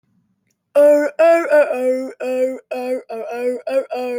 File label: goofy ahh; fnaf